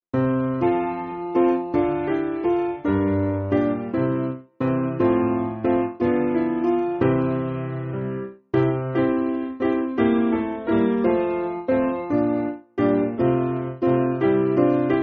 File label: Piano, church music